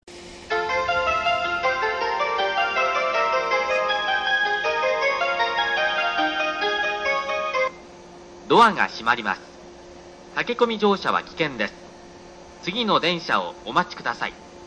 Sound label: higashihanno melody; hachiko; jreast